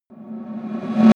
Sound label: reverse hit dark rumble